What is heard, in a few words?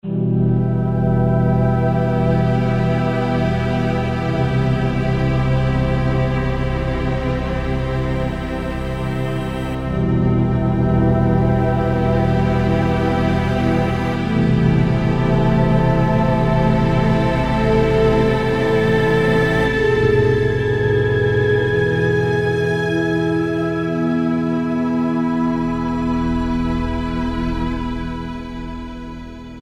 LOW WORLD SHIFT, Kurzweil K2000; SYNTHS; Kurzweil K2000 Value Pack; Extra Programs